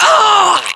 death

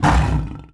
Lion Hurt Vox; Civ4 warlords

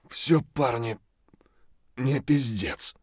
hurt neardeath; Escape from Tarkov

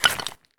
jump tile; Escape from Tarkov

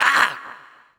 player heavyhurt

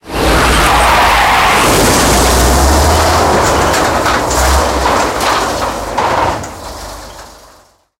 turn wheel, tanks; War Thunder

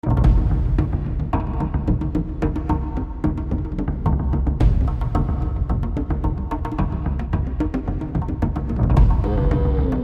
War Drums; Civ4 beyond sword; Diplomacy

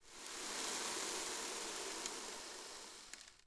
desert sand; Ambient; Civ4 warlords

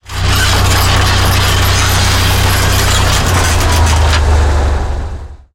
turn rattle, tanks; War Thunder

